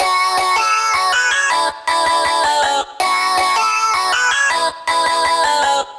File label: Vocoder Loop 160 BPM F# Min; Vocals, Chop Loops; Cymatics; Mirage